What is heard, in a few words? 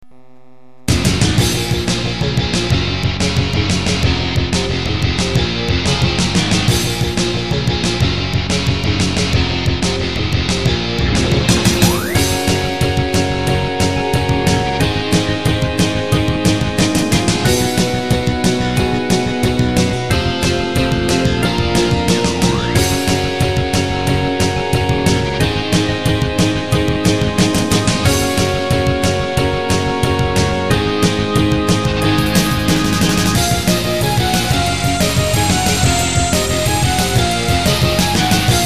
battle